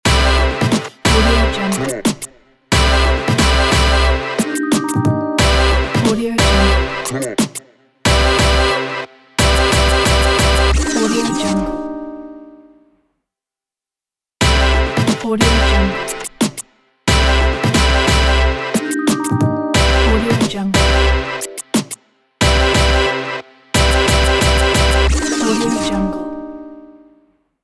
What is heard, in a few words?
hiphop logo